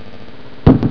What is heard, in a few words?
thump